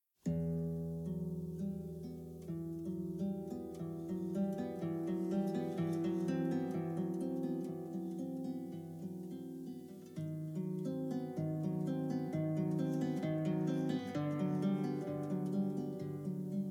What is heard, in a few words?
Guitar; Tekken 7